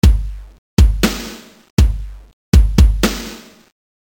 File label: Drum